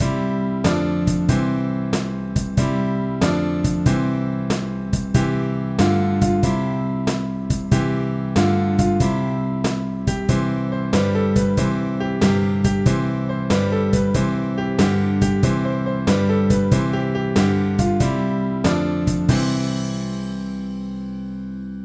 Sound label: keyboard